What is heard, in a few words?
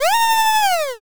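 scream male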